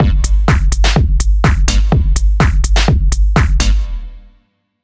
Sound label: Techno Kit Dark